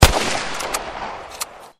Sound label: Gun Sound; Gangstar; Gully Loops